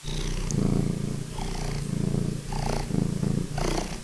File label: cat idle